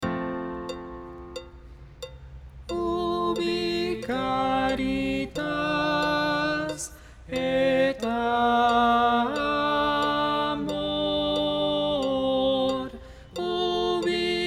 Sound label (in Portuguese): contralto; Ubi caritas